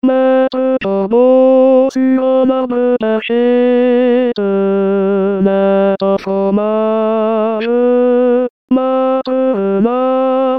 Tenor, Chorale

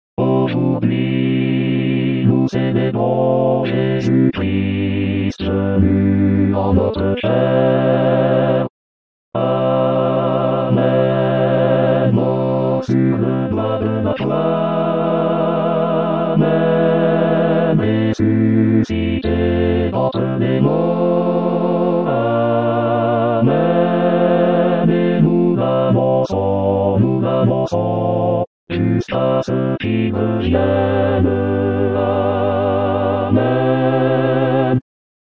Venu en notre chair, Chorale

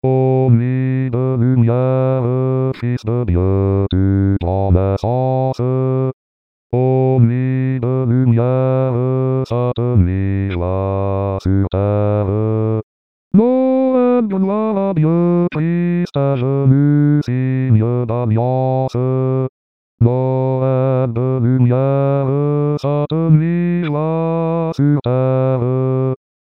O nuit de lumiere Basse; Chorale

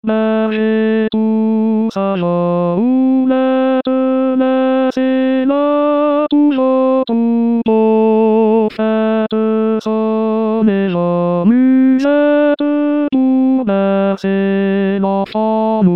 Ecoutez la voix des anges Tenor, Chorale

